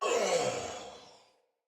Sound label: Death, Barks Battle Soldier; Sinecure Soldier